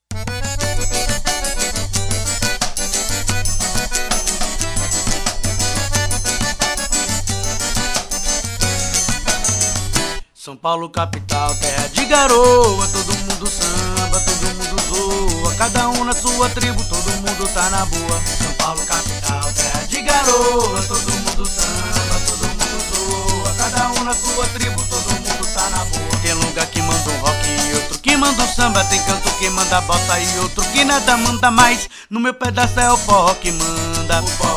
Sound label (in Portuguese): forró